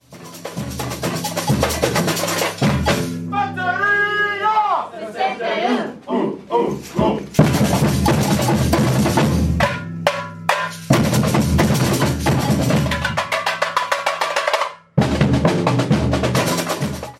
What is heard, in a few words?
Samba break; rhythms